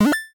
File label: retro coin; retro synth SFX